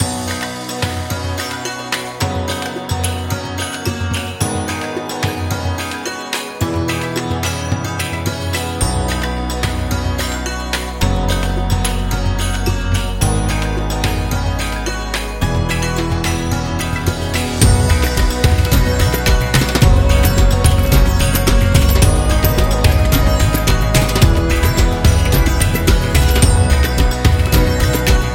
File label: Indian Loop